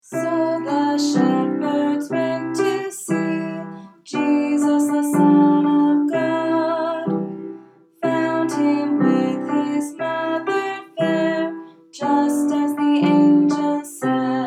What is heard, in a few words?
recitative